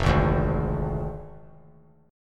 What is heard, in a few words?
F Minor 6th F down; Fm6; strummed; JGuitar